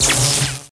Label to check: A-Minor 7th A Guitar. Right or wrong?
Wrong